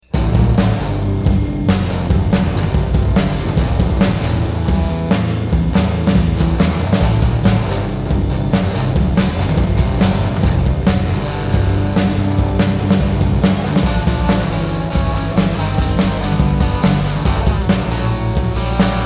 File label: Instrumental